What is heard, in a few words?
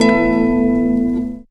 strummed, Guitar; Notes